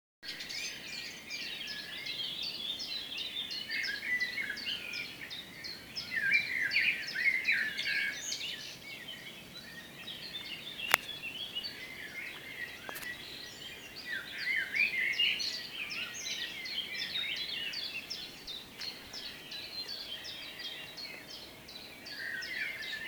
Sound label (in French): vocal